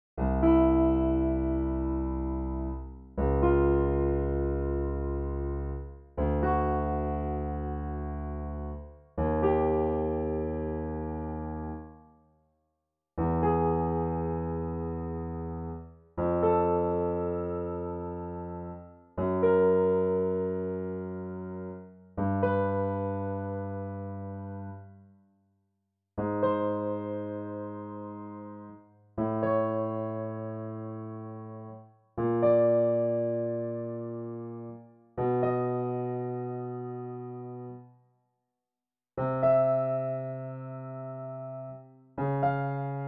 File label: Midi Check file 17ths